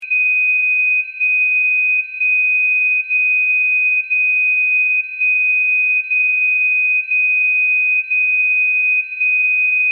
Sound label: dcf signal